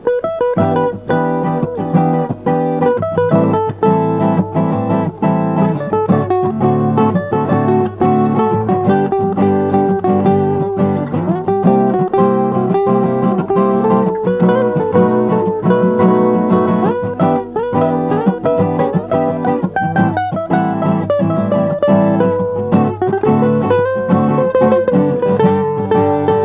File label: Medit solo; Scorchio